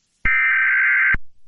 eas alert

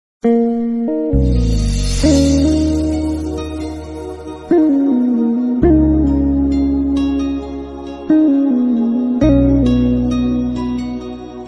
instrumental music; turkey

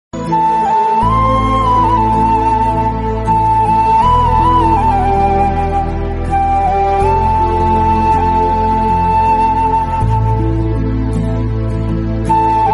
flute instrumental

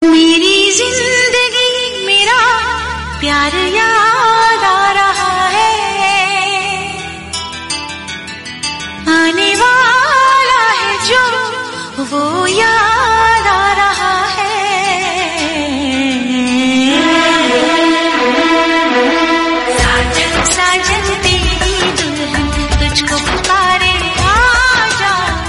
hindi romantic song

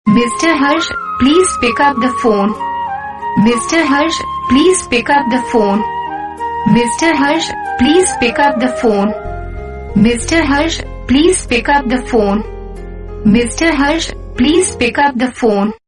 please pick up the phone, name song

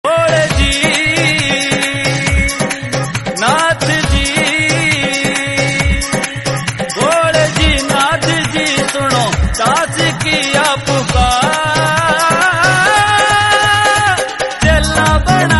devotional hindi

